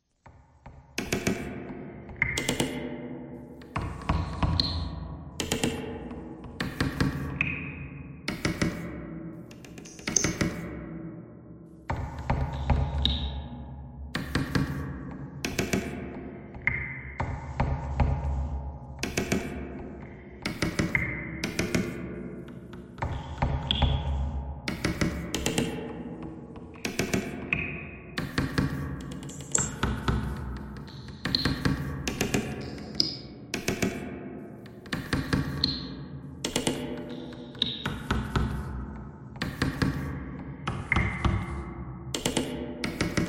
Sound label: bagpipe thread noise; reverb